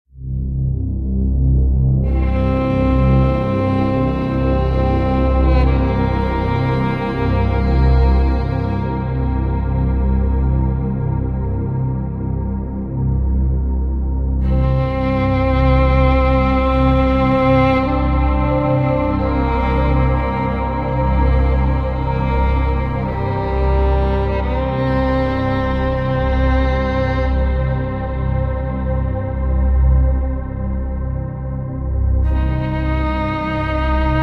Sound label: 528 Hz Deep Meditation